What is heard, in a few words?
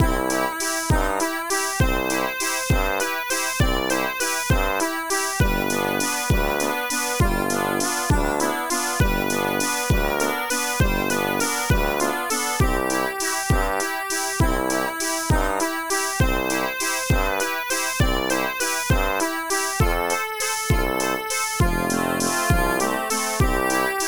Circus; The Big Red Adventure; Amiga